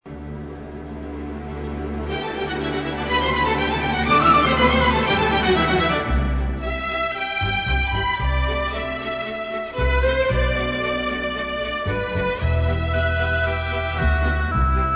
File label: INSTRUMENTAL